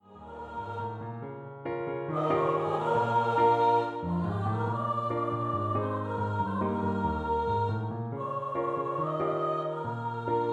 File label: unison and 3 part round for Mixed Voice Choir